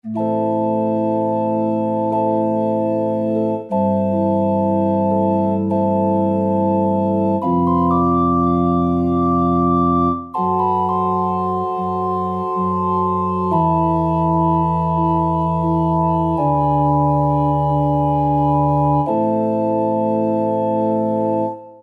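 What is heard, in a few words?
Organ Flutes, Roland SR-JV80-07 Super Sound Set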